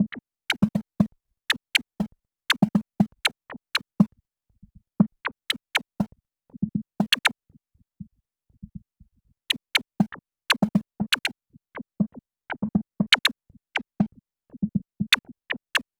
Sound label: Noise, Prototechno; LFO & Noise; Sonic Foundry